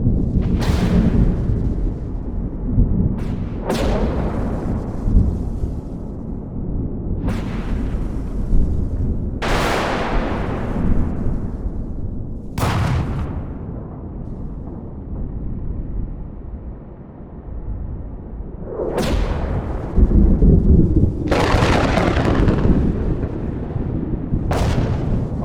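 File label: ambient electric storm; Stellaris; cosmic storms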